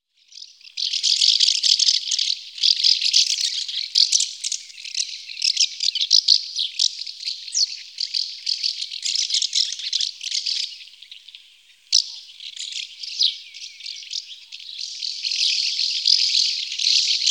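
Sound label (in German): Mehlschwalbe, house martin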